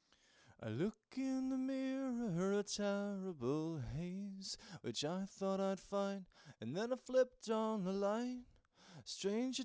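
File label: no drums, live recording, 250 ms, source; SiSEC11; underdetermined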